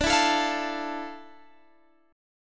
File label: F Major 7th, D; Fmaj7/D; strummed